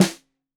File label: Snare 65 Reg Stereo, Deeply Sampled Drums